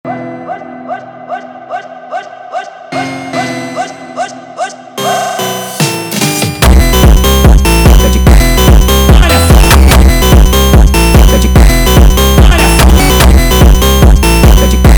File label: Funk sped Up